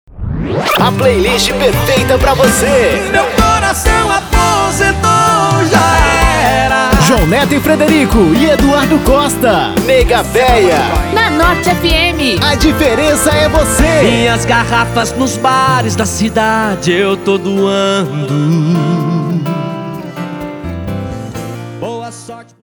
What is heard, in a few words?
intro music power